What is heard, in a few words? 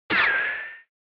Retro Anime Gun